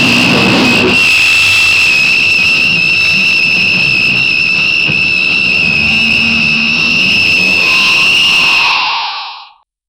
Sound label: giant amphibious slimy, kaiju